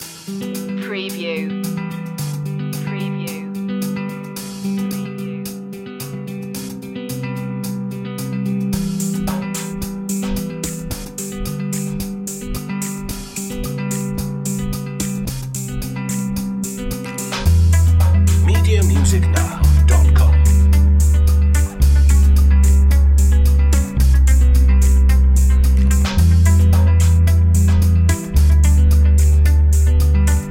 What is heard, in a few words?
positive guitar background